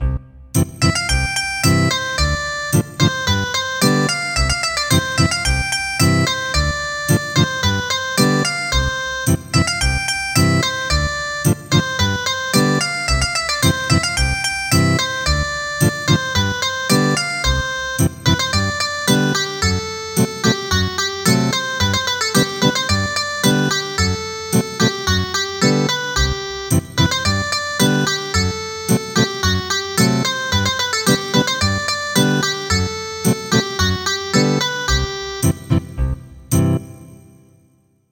Ethnic Guitar, traditional; midi

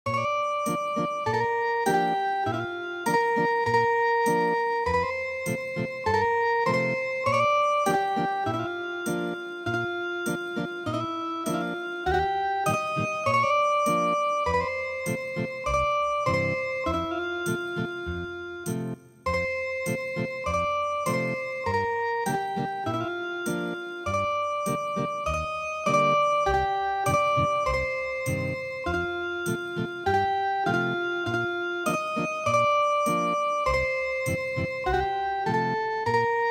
Ethnic Guitar; How Can I Leave Thee

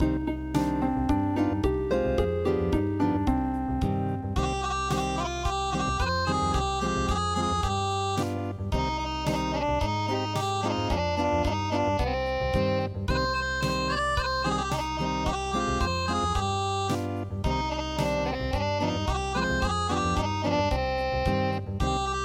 hinamatsuri Unplugged

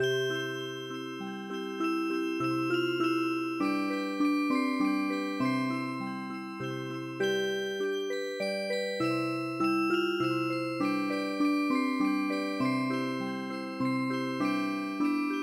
Dance Music Box; ドイツ民謡の「ライン地方の踊り」に癒し系のアレンジを施したオルゴール曲を聞くことができます。「ライン地方の踊り」は、ミディアムテンポな3拍子のドイツの伝統曲です。; ＰＣ ＭＵＳＩＣＢＯＸ

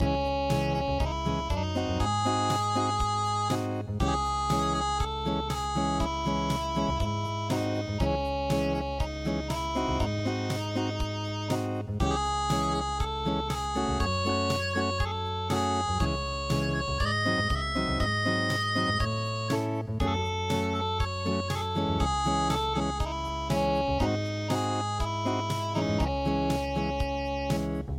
unpluged

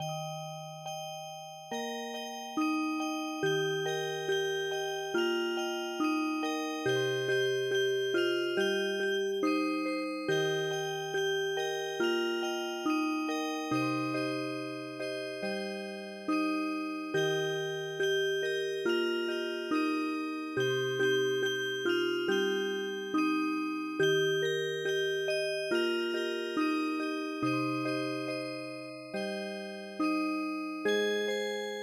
Music Box; Holi Song Rajasthan

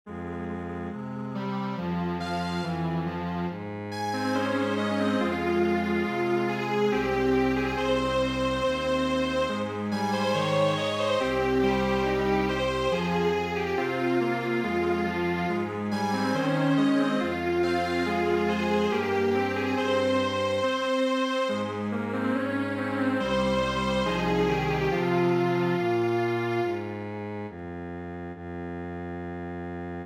Strings Quartet

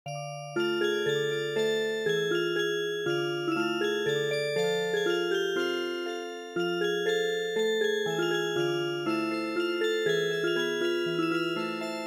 Music Box

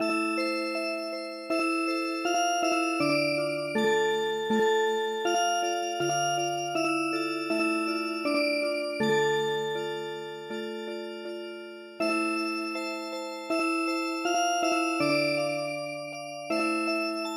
Music Box, traditional

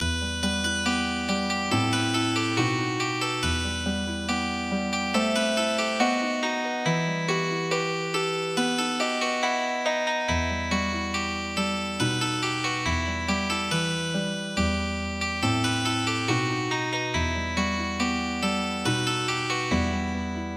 Healing Harp, midi traditional; When that I was and a little tiny boy

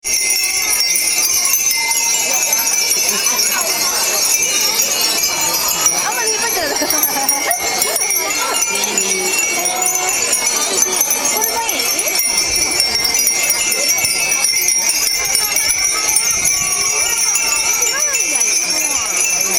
windbell, soundscape

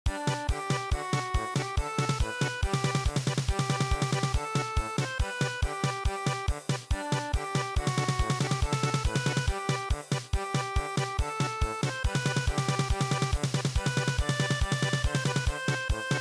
German Polka, traditional; Duncan Gray

